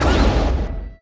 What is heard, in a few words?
Samurai Appear; unsighted; enemies